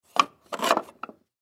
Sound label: Foosball Table; Foosball Table Ball Grab Return Slot